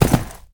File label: land grass, footsteps; Call of Duty Modern Warfare